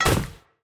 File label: Death Stumble; portal quest; android; Paladin